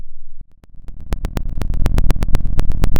Sound of a fire crackling

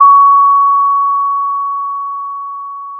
Sound of a school bell

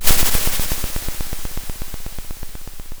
Sound of a drum roll

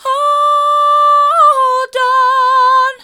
hold on mid, vocal

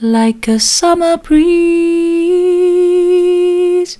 like a summer breeze soft, vocal

